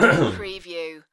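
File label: Man Clears Throat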